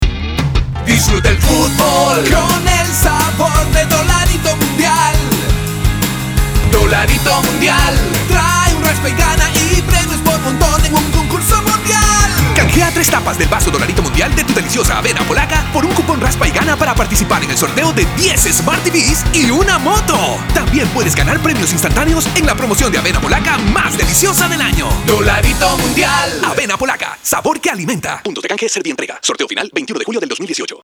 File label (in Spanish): Jingle Mundial; Dolarito